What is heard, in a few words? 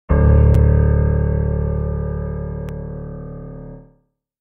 horror piano tone